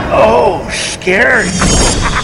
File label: scary; freak fortress 2; freddy krueger